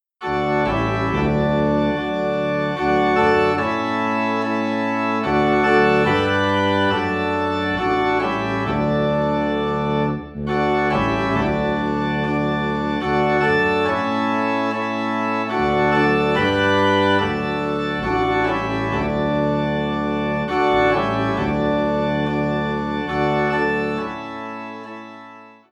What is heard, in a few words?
Organ in D; Come Thou Fount